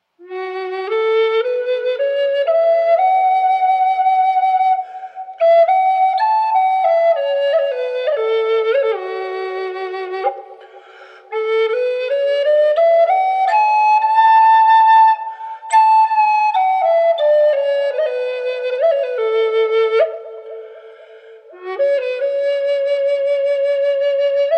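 F-sharp minor tamarind wet, spalted tamarind flutes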